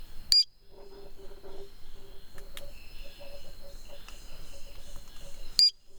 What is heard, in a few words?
Stumpffia maledicta